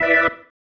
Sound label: Stab One Shots, Organ; Loops; Stabs; Sonic Foundry (Sony Creative Software); Joe Vitale Organ Donor